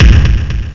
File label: drum